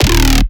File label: bass hit acid glide, Bass Stabs; Virtual Riot